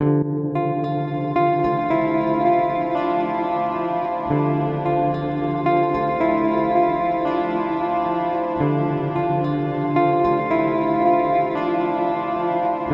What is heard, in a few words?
dreamy taps; eventide h9